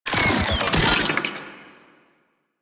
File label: Sealed Cache Terminal Shutdown; Warframe; Zariman